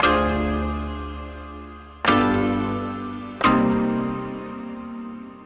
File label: Taped 88BPM B min, Pianos; Instrument Loops; 99Sounds; Monosounds